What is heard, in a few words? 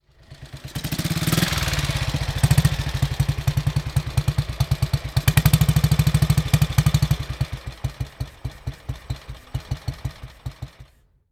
Rudge